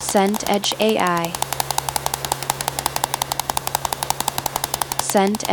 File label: rain on car roof